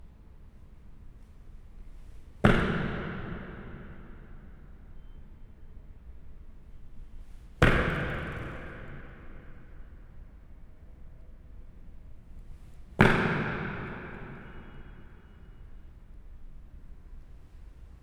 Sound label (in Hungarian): dobbantás visszhangja archeológiai múzeum